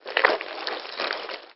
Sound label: slide; mahlendur